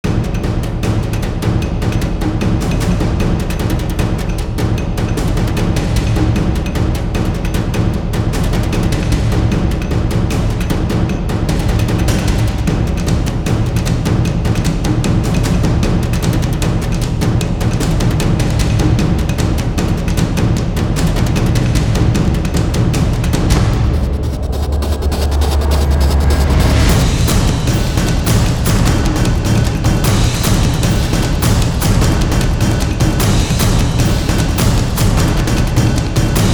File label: Ultimate Countdown, Drums